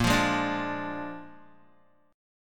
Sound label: A sharp 7th A sharp, Guitar; A#7 strummed; JGuitar